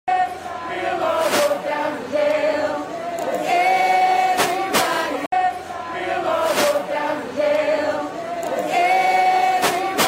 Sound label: live stream audio; D.C. Mall